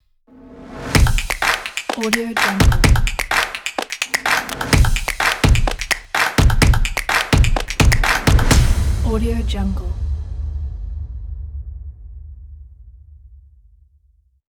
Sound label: drum and claps logo